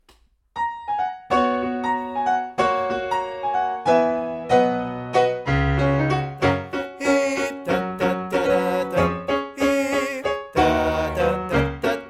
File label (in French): Tatata Tenor